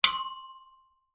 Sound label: impacts metal pole hit ping; zapsplat